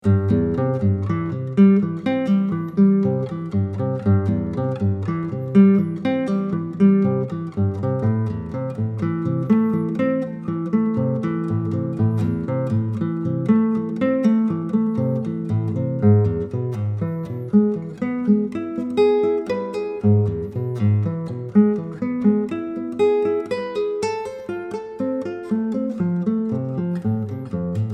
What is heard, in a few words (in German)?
triad study, Dreiklangs-Etüde, guitar; Akustische Gitarre/Klassische Gitarre: Solo; „Triad Study #2“